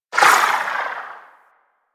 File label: Pistol Tail; Weapons; Sapient; Warframe